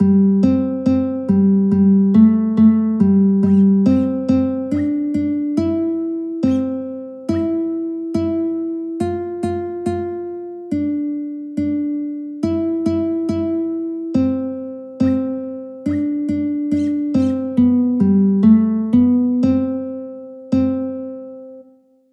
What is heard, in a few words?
guitar; bingo